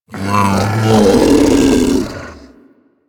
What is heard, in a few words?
Infested Femur Vocal Charge; Warframe